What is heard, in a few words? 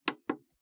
Button